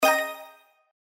Win Animation End; slots; St Paddys Day Blast

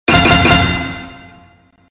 Spin Stop Multiple; slots; Sharks Vs Dolphins

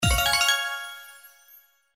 SFX Symbol Wild Win; slots; Panda Majesty2

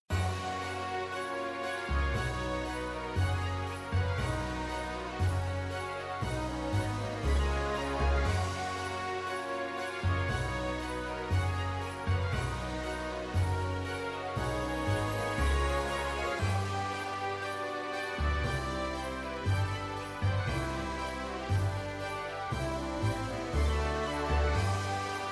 BGM Free Spin; slots; Christmas Blast

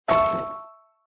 bonus Click; slots; Paradise Escape

